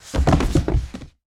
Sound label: Bodyfall Wood